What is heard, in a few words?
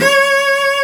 CELLO C#5; AKAI S-Series CD-ROM Sound Library VOL-2